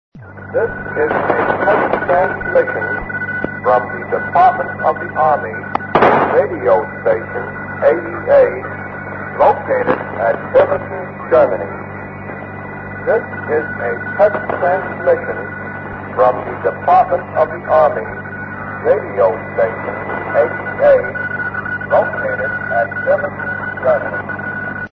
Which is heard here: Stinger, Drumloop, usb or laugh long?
usb